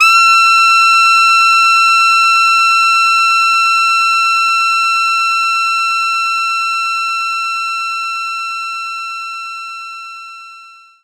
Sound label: Alto Sax F6; knights try